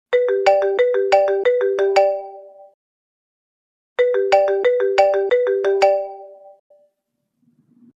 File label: marimba